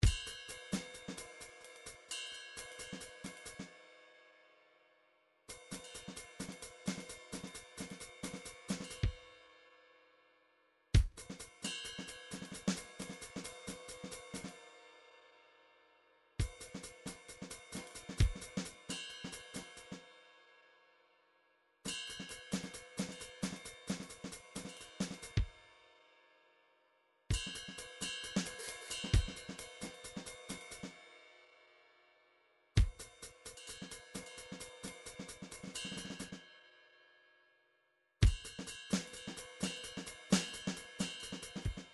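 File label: drums jazz pattern, midi; Paul Kodish Dangerous Drums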